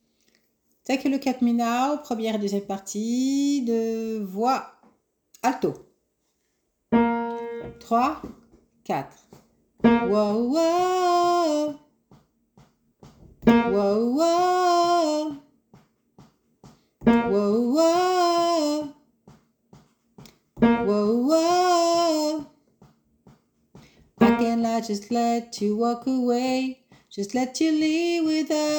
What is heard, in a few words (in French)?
2 parties voix alto; 2 eme partie